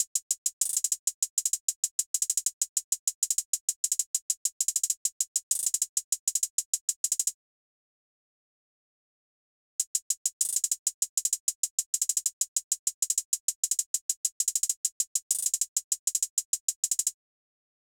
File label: top hats; peroxide peak